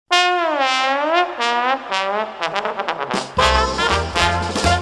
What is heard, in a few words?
creole trombone